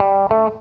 FUNKY, Grooves

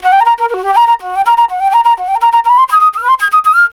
flute, D brass licks

